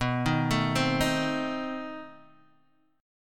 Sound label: B 7th Flat 9th, down, Guitar, Open G